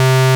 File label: P5 SQR, Vintage; INIS - Vintage